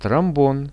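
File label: trombone; тромбон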